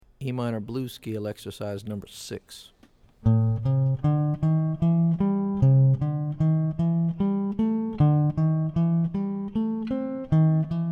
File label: A Minor Blues Scale; lead skills materials